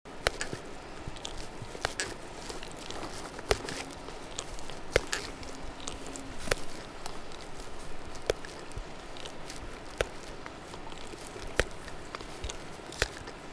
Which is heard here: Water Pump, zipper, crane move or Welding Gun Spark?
Water Pump